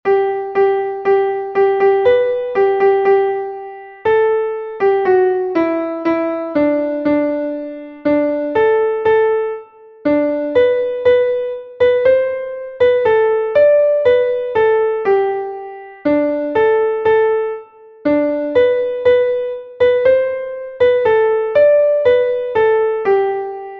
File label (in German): klavier; MelodieMeister